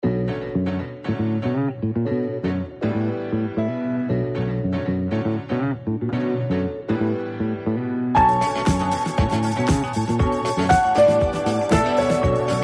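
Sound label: Disco Grooves funk disco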